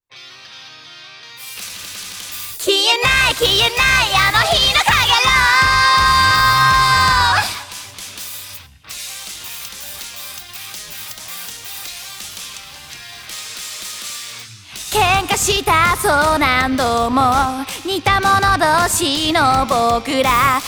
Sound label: Acapella; Gintama